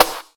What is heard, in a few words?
sizzle snare single hit b key; snare drum samples